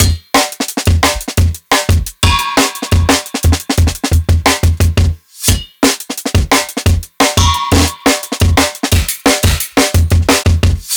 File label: Live Jungle Drum Break, 175; JungleBreaks24